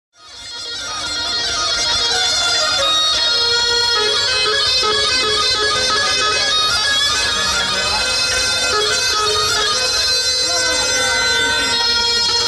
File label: Qushmeh, ساز قوشمه